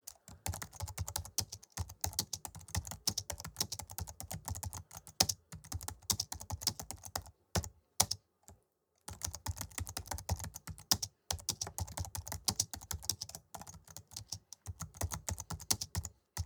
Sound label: Keyboard